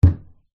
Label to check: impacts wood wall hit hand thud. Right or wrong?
Right